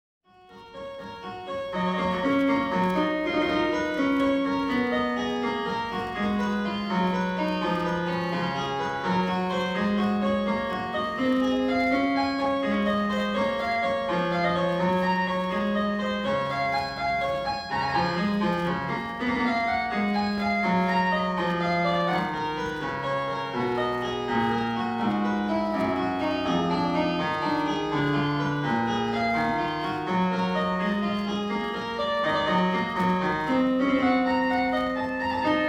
Well Tempered Clavier Prelude in Fugue No.13 in F sharp major; JS Bach